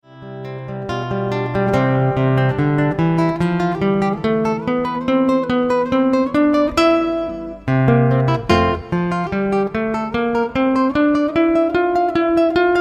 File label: maestroso, guitar